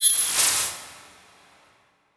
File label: Title Transition Laser Short